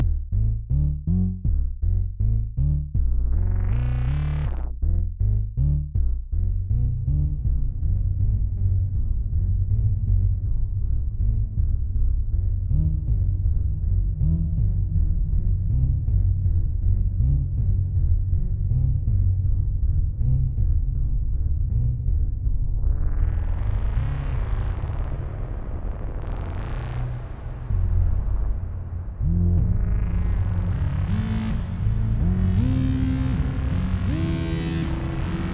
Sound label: Atmosphere Bass Reverb; Newfangled Generate